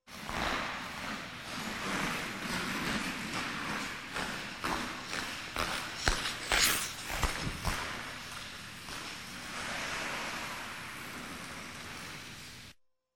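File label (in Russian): еще мимо; Звуки катания на коньках; probegaet mimo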